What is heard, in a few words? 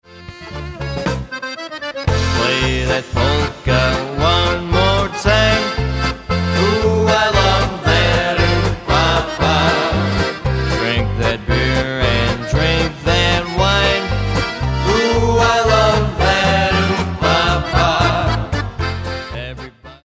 Oom Pah Pah Polka